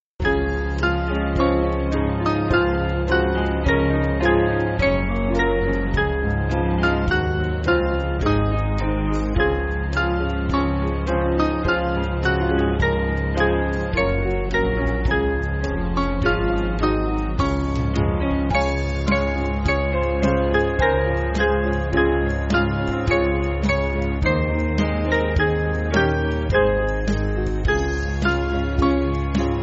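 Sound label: Band, Backing Files for Churches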